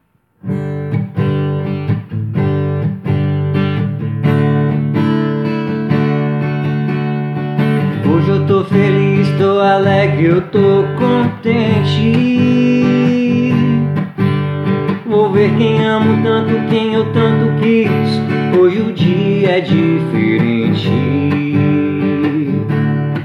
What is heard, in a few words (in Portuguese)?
violao e violao